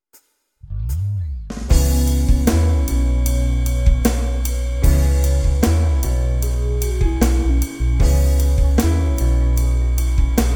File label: simple easy listening